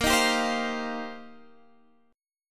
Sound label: C Minor 11th; chord shape